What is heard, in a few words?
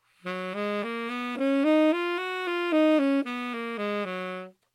Gb or F sharp major scale, saxophone